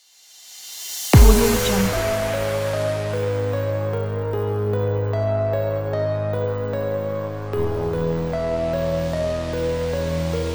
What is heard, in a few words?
gangsta hiphop; quantumnoise